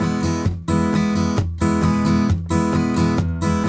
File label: RIFF, Blues Guitar; ILIO; Fretworks